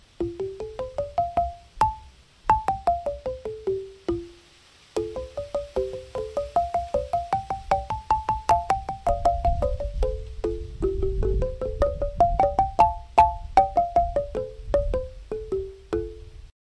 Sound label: Mongo Mongo Stonaphone; children's museum